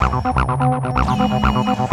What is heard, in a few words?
ACID JAM; Best Service Advanced Media Trax Building Blocks